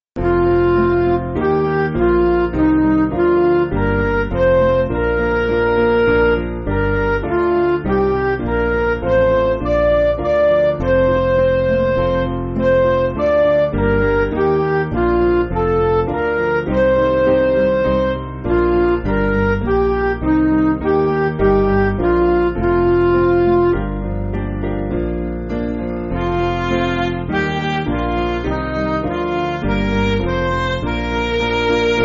Midi

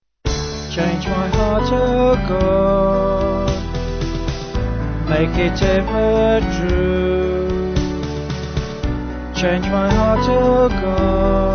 Vocals Band

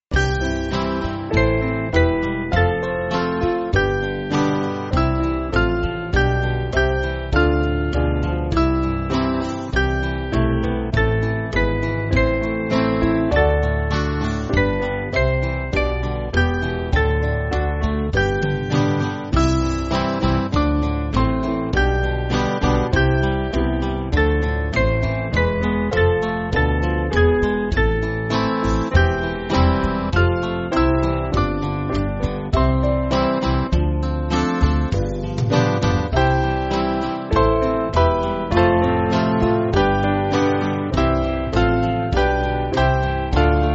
Band; Wherefore O Father

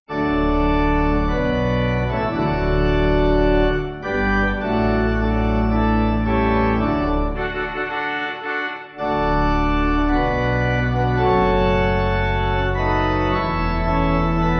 Pipe; God Of Our Fathers

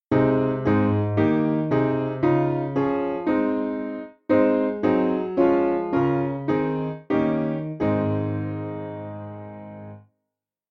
Christ Whose Glory Spanish Hymn Piano

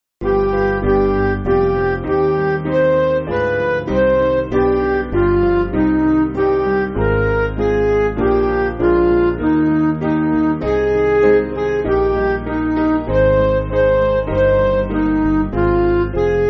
Midi; We Saw Thee Not; Credo

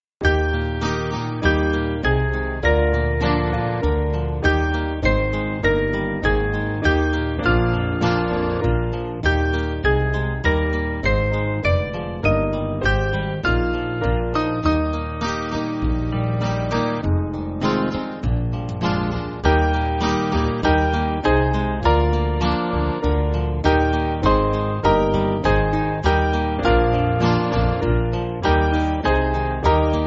Band, church music

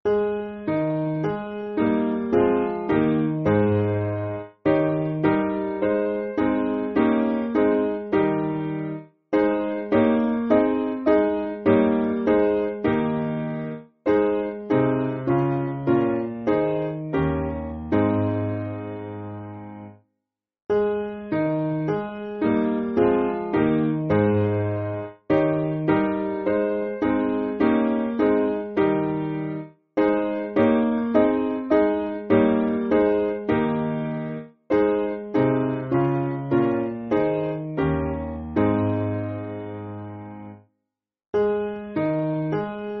Piano, church music; Father Let Thy Kingdom; Ephraim

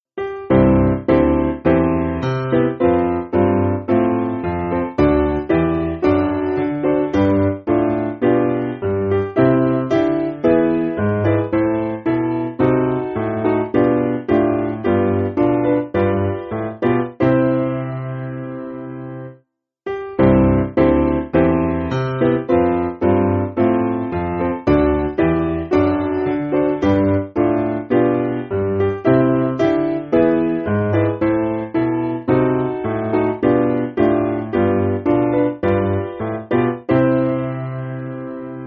Piano

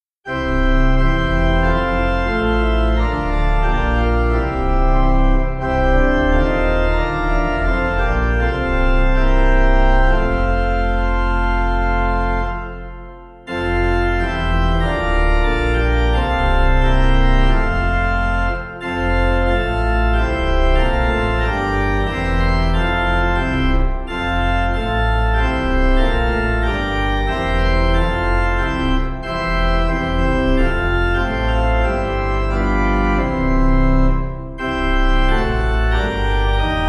It Is Finished Sebastian Pipe

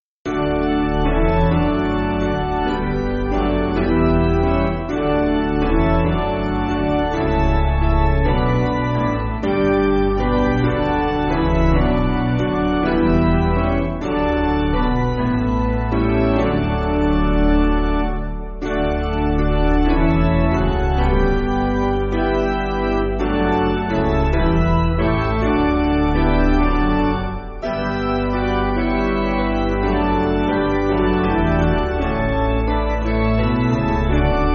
Piano, church music

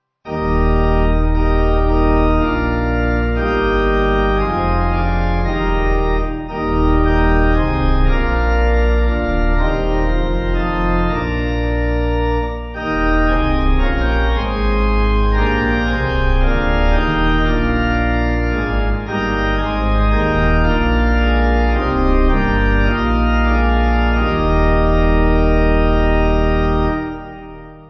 O God Of Truth, Pipe

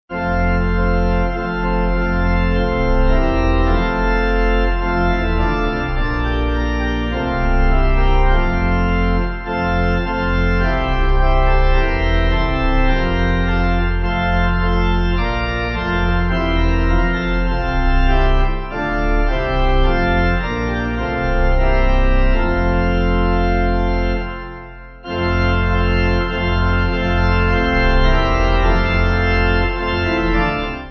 Pipe

